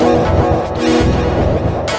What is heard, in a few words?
TABLAS; Zero-G; Chemical Beats